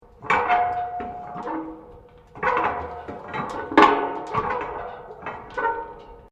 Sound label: METAL CREAK LARGE; Materials